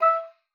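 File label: E5, oboe d'amore; Xsample VOL-7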